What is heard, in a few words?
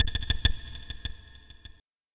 TOM INDST 140, Industrial, Drum